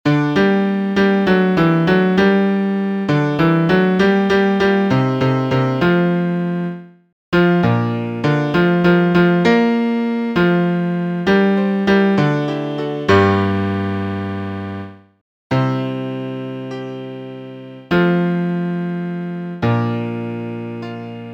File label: Piano; Allez par toute la terre